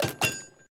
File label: PickUp Metal